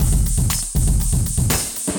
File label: Acoustified Kit, Drums